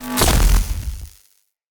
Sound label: Shield Enemy Impact